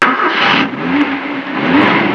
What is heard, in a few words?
car ignition